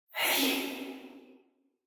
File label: Ghost (damaged); Phasmophobia